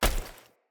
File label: Foley Action Default Body Hit